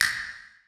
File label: castanets; Percussion; Sonatina Symphonic Orchestra